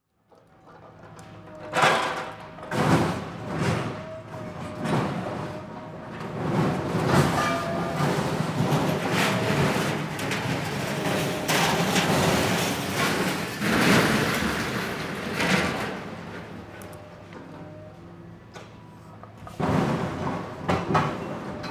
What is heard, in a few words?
scrapyard lifting crane